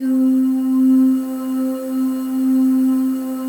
PAD; DIGI-PAD

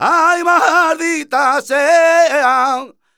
voc c, Flamenco Vocals Male Cantaor; Male Cantaor 1

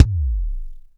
BD, Bassdrums, Roland TD-20

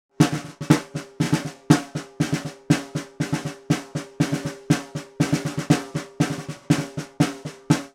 Vintage Ludwig Snare, Percussion

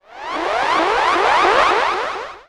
ALARM

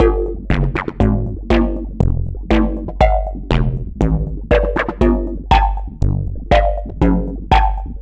Resonated 808, Drum Loops

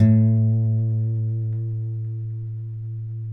Finger A 2 L, Guitars; Spectrasonics; Hans Zimmer Guitars VOL-1

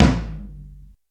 Roland U110 Rock Drums F1

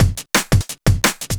Accelerator, breakcore

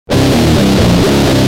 G Jam, Guitar; Industrial

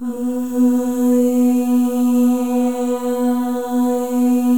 MOVING V1, Symphony of Voices; Spectrasonics

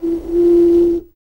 BLOW, BREATH FX; Spectrasonics; Vocal Planet; Jazz FX

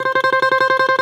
BALALAIKA, Prima Balalaika, Plucked Strings

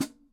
Pedal; Digital Sound Factory

